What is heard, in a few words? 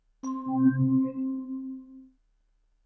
Beaming Start; Samsung SGH-i320; System Sounds